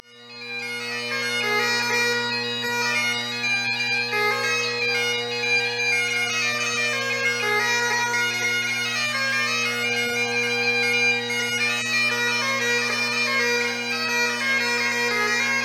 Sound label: JIGS